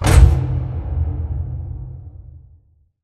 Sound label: sliding door lg verb close stop; Titanfall 2